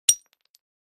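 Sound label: Large Tile Square Pieces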